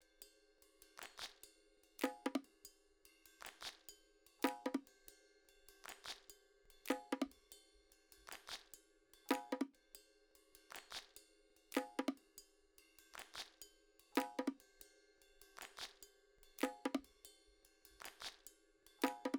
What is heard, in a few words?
perc, wind